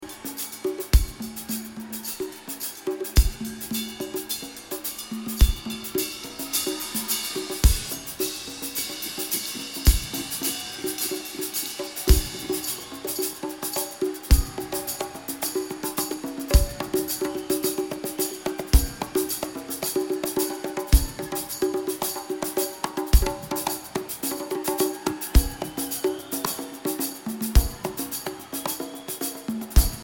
Drums

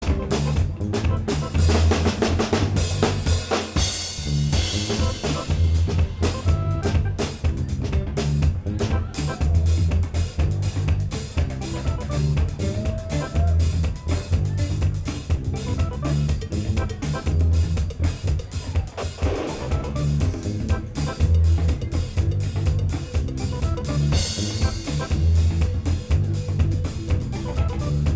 Improv Jam

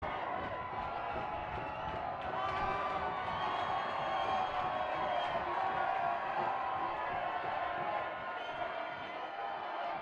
Crowd Banter

